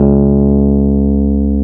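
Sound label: Piano Rhodes; Electrics; Club-50 - Foundations Roland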